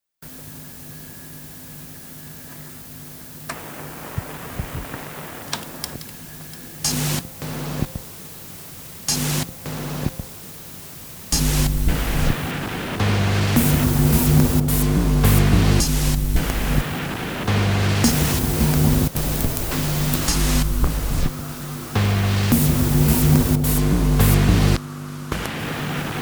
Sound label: Tape Hiss